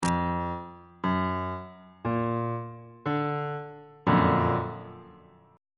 f sharp augmented major 7th 3rd inversion

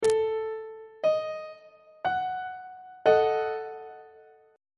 d sharp diminished 2nd inversion on treble clef

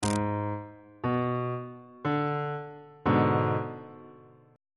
a flat diminished chord

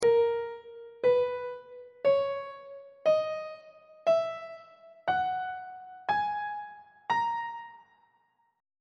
a sharp locrian mode on treble clef

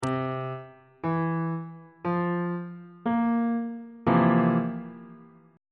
f major 7th suspended 4th 2nd inversion

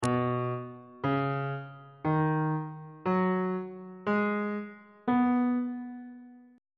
c flat major pentatonic scale on bass clef

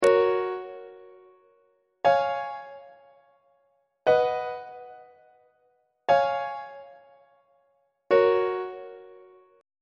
g flat major plagal cadence; on treble clef

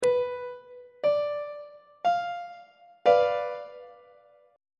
a double sharp diminished chord on treble clef

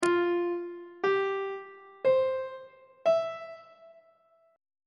f major 7th suspended 2nd chord intervals; on treble clef

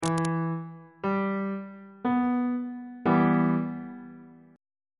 d double sharp minor chord on bass clef

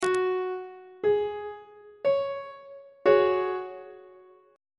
d flat suspended 4th 1st inversion on treble clef

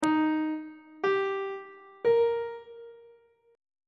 e sharp mixolydian chord 7 is d sharp major; on treble clef

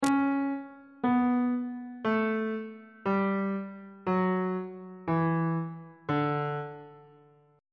e double flat major scale descending on bass clef

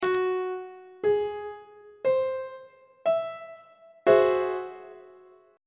a flat augmented 7th 3rd inversion; on treble clef

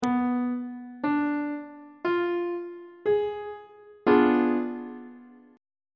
c flat diminished 7th chord; on treble clef

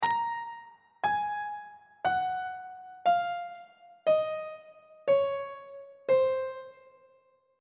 b sharp locrian mode descending on treble clef

